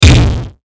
Hit Static Metallic Hiss; Blastwave

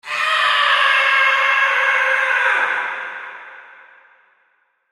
Horror Scream Processed Effect Male; Screaming; Voice

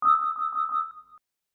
Hip Hop Dark Rhodes Licks 90 Bpm Straight E

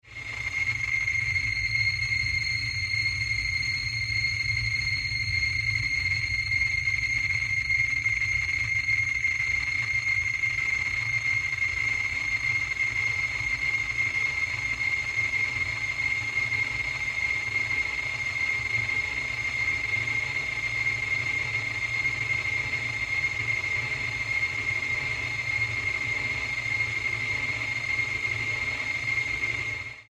Drone Scifi Piercing Tones Distortion Static; Feedback; High Tone; Sound Design

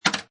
Pinball Sound Clank With Recoil; Video Games (Sound Effects:Games)

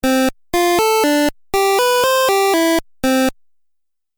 Musical Phrase Video Game; Musical Sound Effects

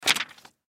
Paper Cutter Bed Remove Paper; Office; Blastwave

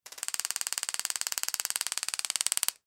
Police Stun Gun, Stun Gun Post Shot Re-Stun; Emergency; Stun Gun Post Shot Re-Stun Long; Gun Shot Sound Effects

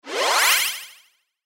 Cartoon Ascend Spacey; Fast Chorus; Cartoon Music

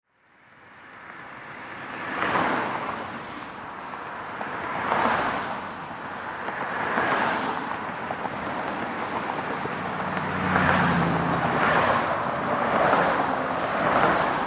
Traffic Expressway Drive By Left To Right Close; Transportation Automobile; Traffic And Car

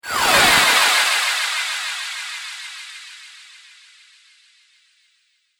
Laser Future Plasma Fire; Laser Gun Sound Effects; Sci-Fi Laser; Andrew Fleming

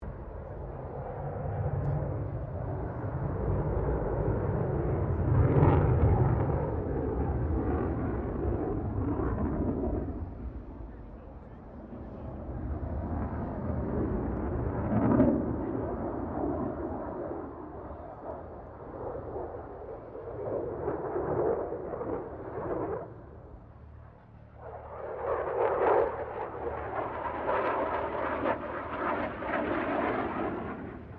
AIRCRAFT FA-18 HORNET PASS DISTANT; RAAF FA-18 Hornet; Airplanes; Vehicles

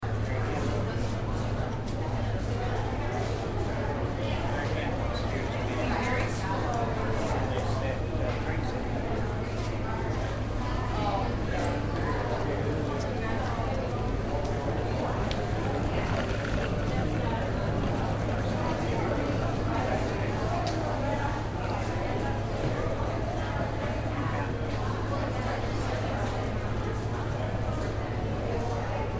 Fair People Walla; Loop; Amusement Parks; Ambience

